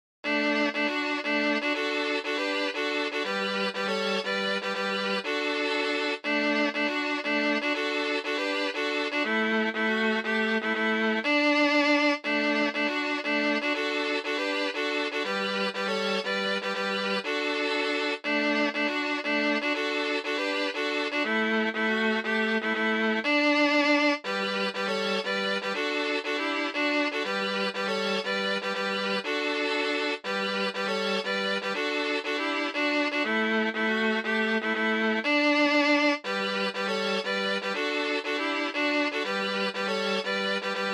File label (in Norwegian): boksemusikk; Rett og rangt